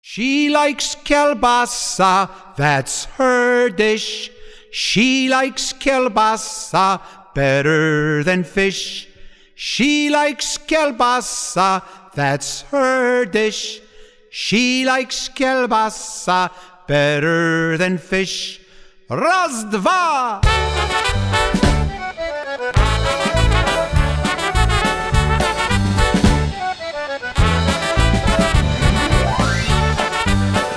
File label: Polka